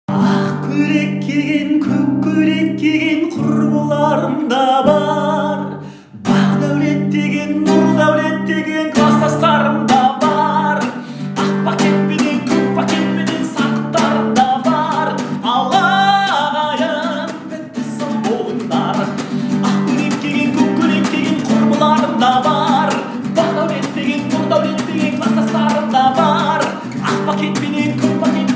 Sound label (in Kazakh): gitara cover